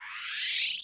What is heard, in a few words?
holo off, cardassian technology